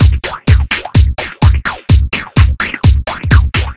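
Funk No snares, Drum Loops 127bpm, Nu Dance Electro; Zero-G; Soundclash Nu Dance Electro; Acidized Wavs; Jumble Funk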